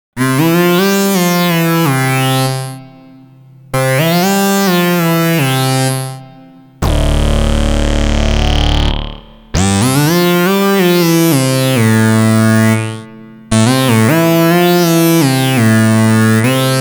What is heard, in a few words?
E-mu Reverb Lead